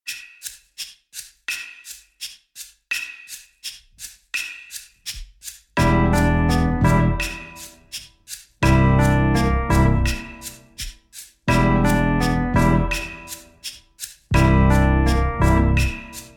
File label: Double Fill